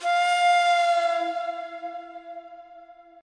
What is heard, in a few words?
afro flute; Flutes